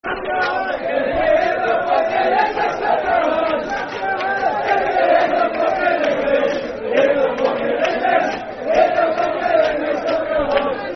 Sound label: Hate The English, Football Chant (Soccer Song), N. Ireland